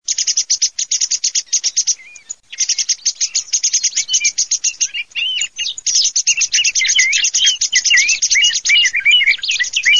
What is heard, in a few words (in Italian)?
TORDI